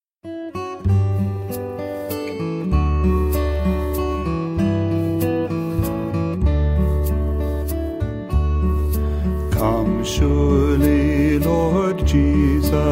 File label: choir; advent music; onecandle